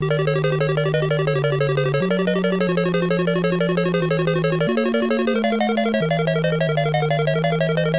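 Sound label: castle, ambience; Canals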